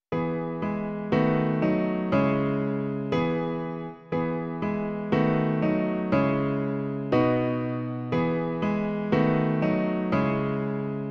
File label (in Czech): klavír, Klavírní verze